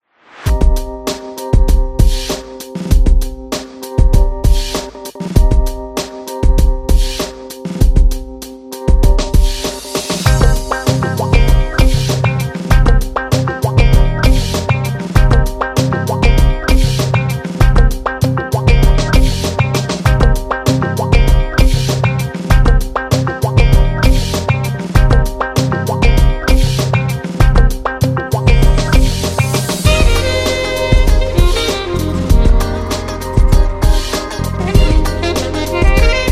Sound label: Hip Hop Chill; Fashion